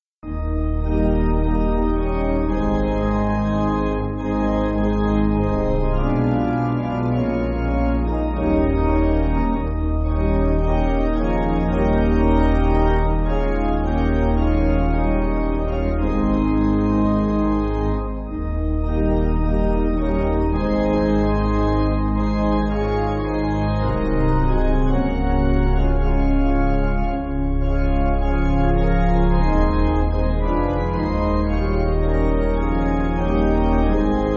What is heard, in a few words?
Pipe